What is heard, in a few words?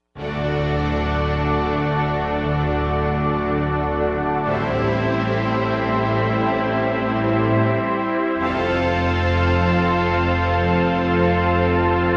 Lush Strings; Digital Synthesizer